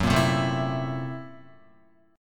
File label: F sharp 7th Sharp 9th Flat 5th F sharp down Guitar; strummed; JGuitar